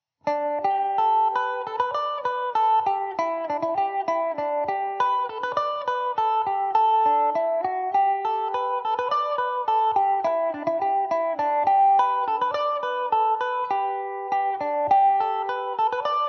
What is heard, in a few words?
G maj, mandolin; Epiphone Mandobird VIII electric mandolin; The Hound's Gowl